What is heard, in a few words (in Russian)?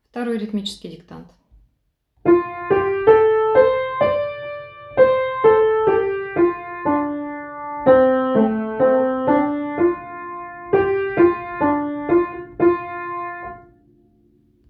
ritmiceskij diktant